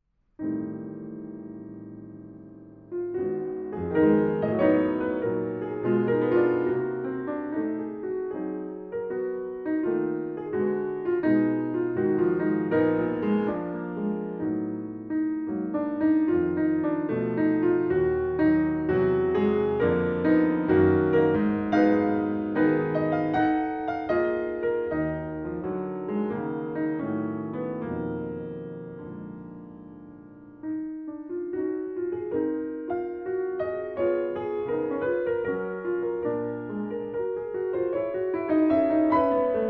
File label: piano solo; splendid variations and fantasies